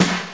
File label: natural snare f key; snare drum samples